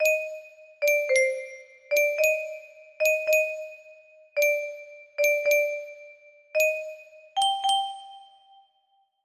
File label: music box